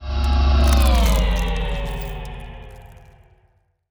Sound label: pro ship juggernaut move; biogenesis